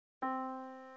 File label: Yamaha Disk, piano sound